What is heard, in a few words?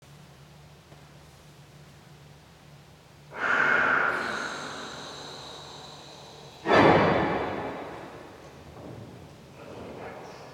improvisation; konzert; nurnberg